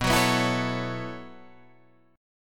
B 7th Suspended 2nd Suspended 4th down Guitar Standard, chord; B7sus2sus4; strummed; capo=2